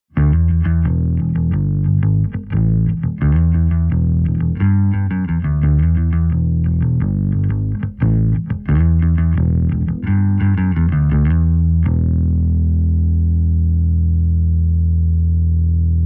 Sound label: ASH VALVE DRIVE